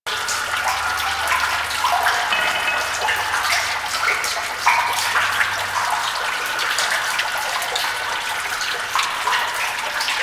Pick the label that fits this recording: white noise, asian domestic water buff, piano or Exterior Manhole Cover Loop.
Exterior Manhole Cover Loop